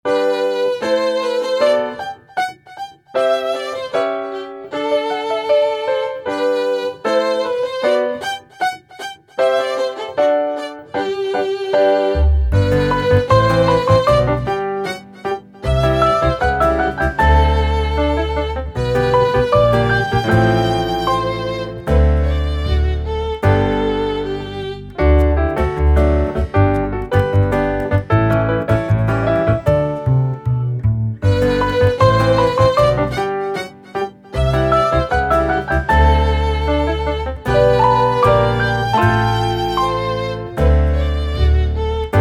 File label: Recreation